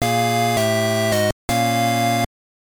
Jingle; K.O. The Pro Boxing; GBC

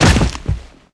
Footsteps

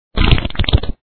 Fart; Dad 'n Me

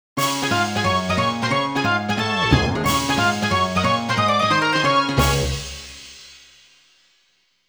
Map Display; Bubble Symphony

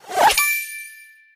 Rewards Medal Flip; candy crush saga gamerip

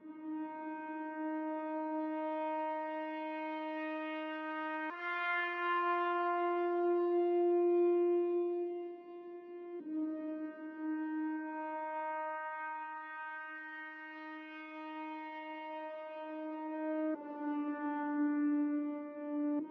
pendulum loop

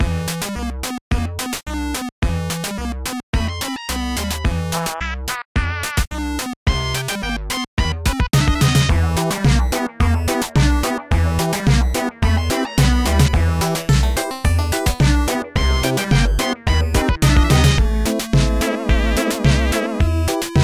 Instrumental; Friday Night Funkin' Unfinished Homestuck mod; 2Beep2Meow

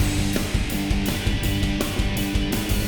Boss Fight Phase 2 MIXED

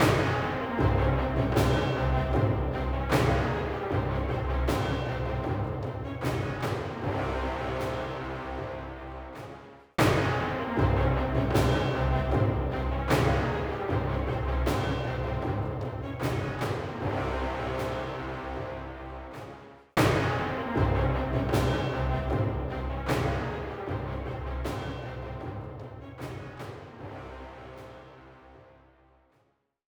Battle Fade; Might and Magic Clash of Heroes

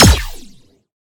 Sciweap Shot Medium Laser Assault Rifle

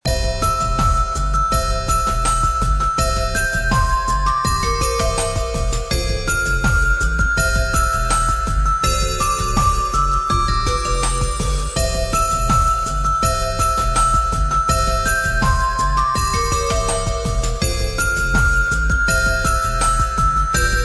christmas loop

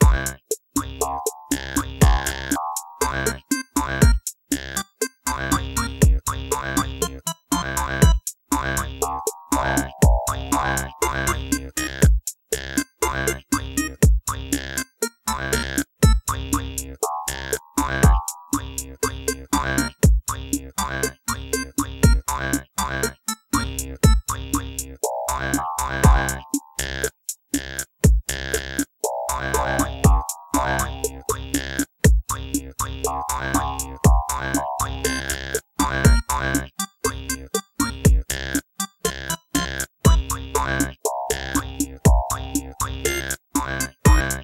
Ramp Room, Battle; Pikmin 2; Olimar

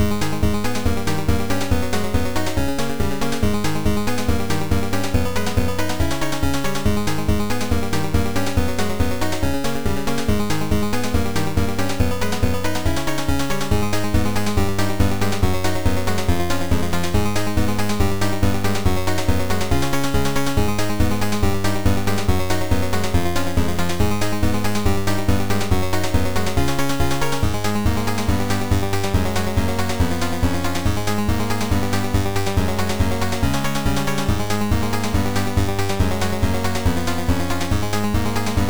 Boss Music; Daggerhood

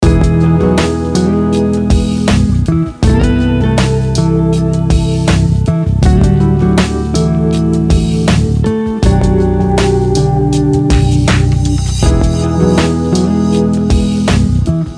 Lounge; Hollywood Files Deadly Intrigues; NDS

